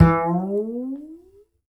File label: Upright Acoustic Bass